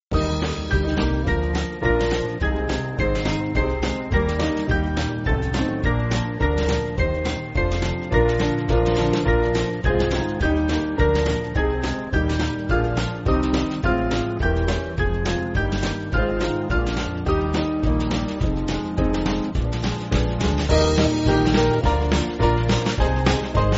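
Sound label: Band, Music Backing Files for Churches; Merton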